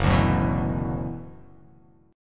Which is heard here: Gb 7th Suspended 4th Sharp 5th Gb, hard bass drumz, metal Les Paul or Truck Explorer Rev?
Gb 7th Suspended 4th Sharp 5th Gb